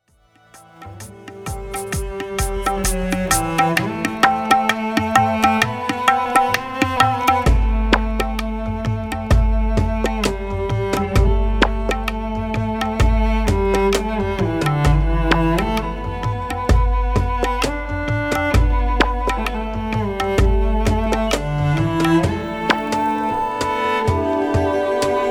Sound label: indian vibes